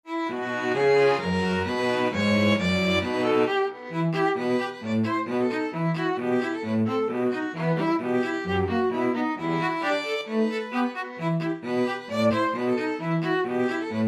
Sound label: string trio